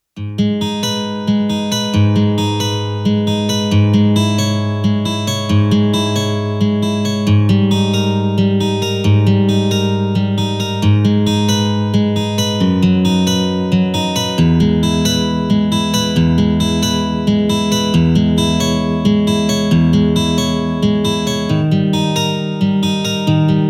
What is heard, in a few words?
vr-09 steel str gt